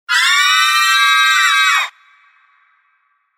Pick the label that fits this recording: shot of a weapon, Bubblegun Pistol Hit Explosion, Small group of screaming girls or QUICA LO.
Small group of screaming girls